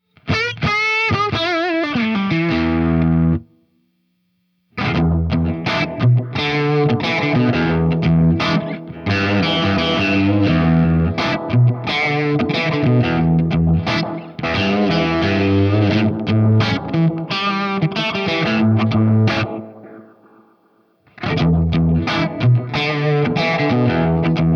Vintage Oil Can Echo, Olivera works with gain as well, here with little modulation and a Short head setting.; Dry and Wet mixed in Pro Tools; Same Old Same Ol'